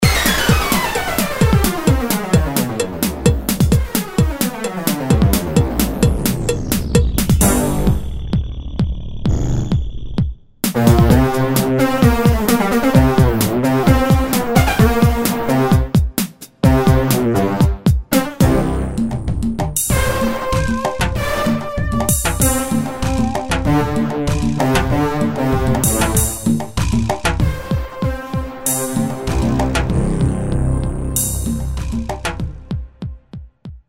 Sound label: chemical brass